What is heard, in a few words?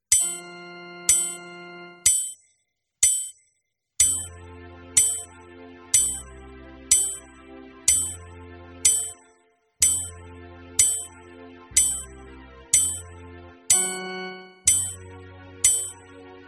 warm up exercises